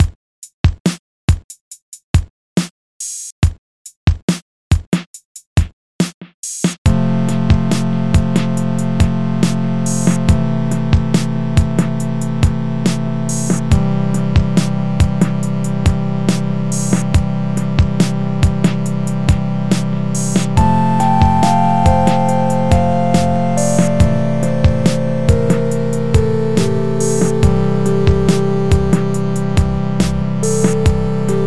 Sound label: Type Beat Sample